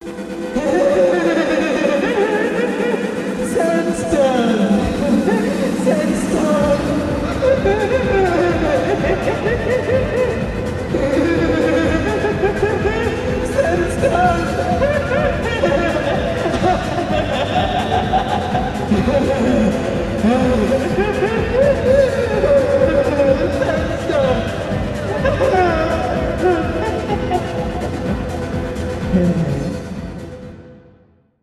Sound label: ringtone